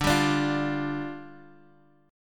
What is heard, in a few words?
D-Minor Double Flat 5th, D; x,5,5,7,6,x; strummed